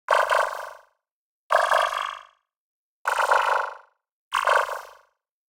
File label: Digital Click Negative; boom library; The Interface